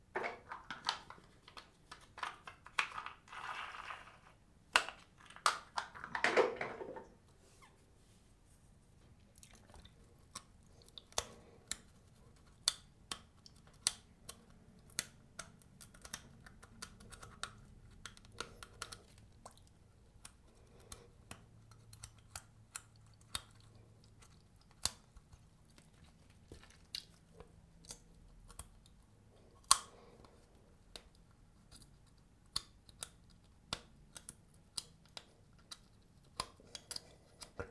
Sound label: Dental floss